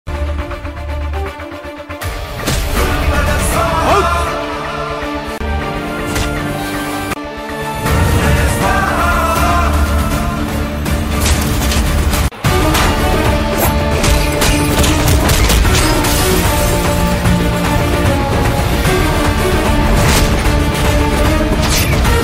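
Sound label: background music ringtone; ramarao on duty; raviteja; divyansha; rajisha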